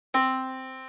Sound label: yamaha Disk